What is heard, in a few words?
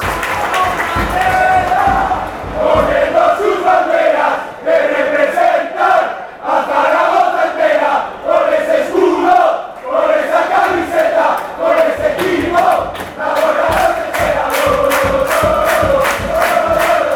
fanchants